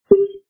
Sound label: UI Button; football; Brazil